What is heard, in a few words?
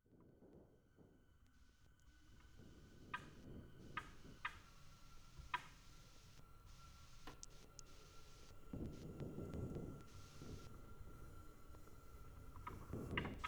piano bells cassettes; untitled for piano bells cassettes